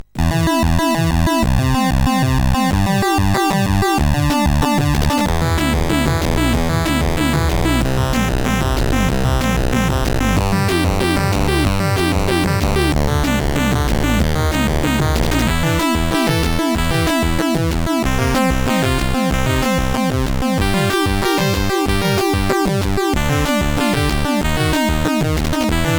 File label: sid, CSG8580R5; Amaze